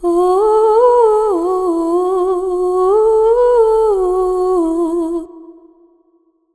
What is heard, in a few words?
Oooh, Vocals, Wet; GhostHack